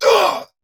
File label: Pain; Warframe; Dax Ashigaru; Battle Barks